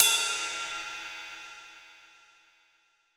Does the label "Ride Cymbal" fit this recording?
Yes